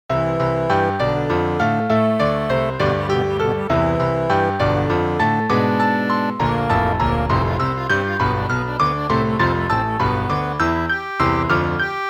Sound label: waltz